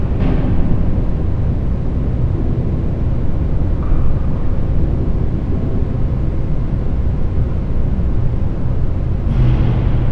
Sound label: station ambi